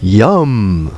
yum; Legend

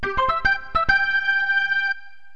Sound Start

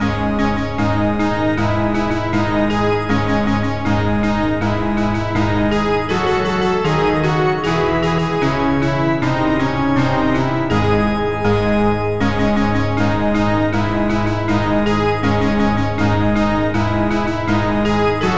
pirate; PIRATE10; 501 Great Games - Volume One; Guildhall Leisure Services

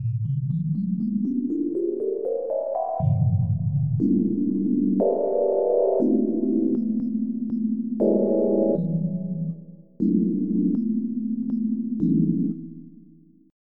Drone; Onj's Soundfont

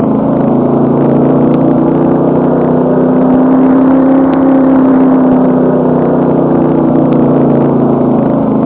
subway

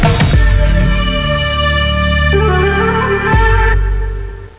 beat end

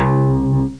Sound bass

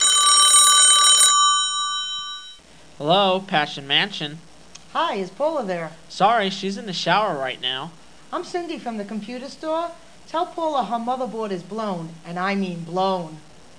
phone

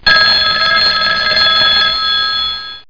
phone

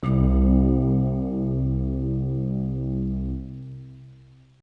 Hybrid Keys; Dance

